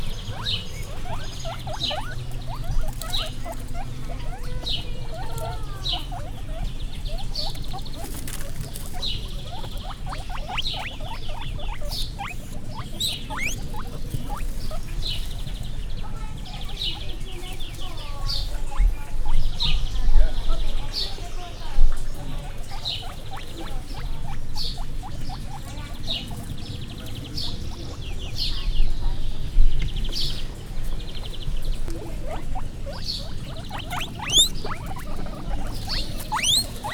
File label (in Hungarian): napközben, tengerimalac; jaszberenyi zoo 2015